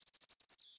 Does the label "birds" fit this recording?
Yes